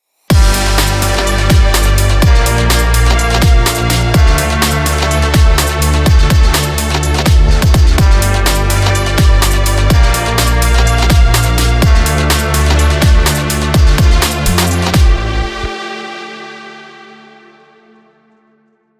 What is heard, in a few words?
innovative background technology